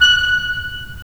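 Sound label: wind clarinet; Roland - LA Composer vol. 3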